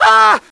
agony

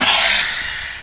pain; scourge

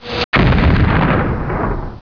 plasma fire

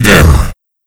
pain; sleeper

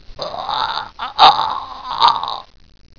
h2o death

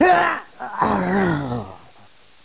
death; sorlag

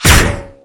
coilgun; weapons

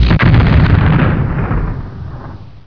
explode, plasma

